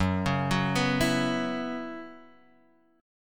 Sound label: B Suspended 2nd Suspended 4th, F sharp, chord